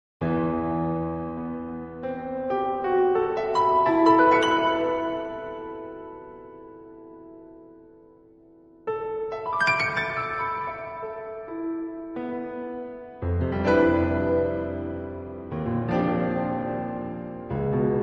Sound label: music